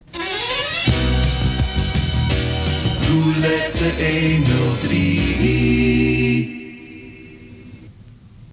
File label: silence